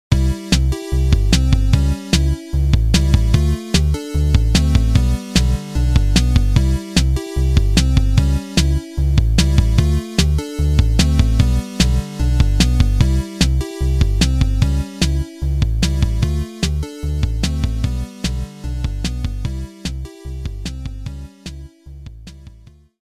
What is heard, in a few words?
TUTORIAL TAMBOURINE; Rhythm Tengoku; Wii